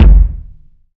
tight low end kick drum single shot F sharp key, kick drum sound